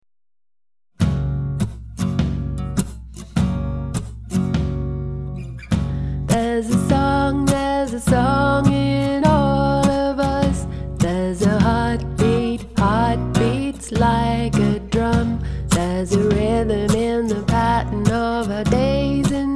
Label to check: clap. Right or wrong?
Wrong